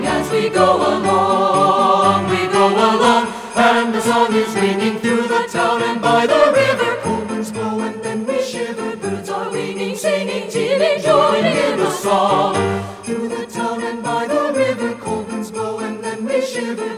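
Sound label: soprano alto tenor bass Full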